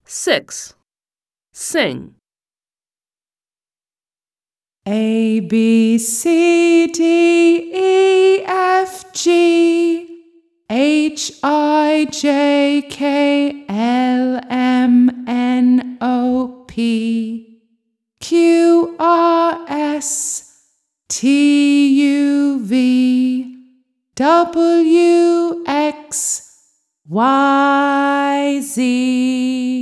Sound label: American song ABCDEFGHIJKLMNOPQRSTUVWXYZ; My alphabet book; Smart Book 1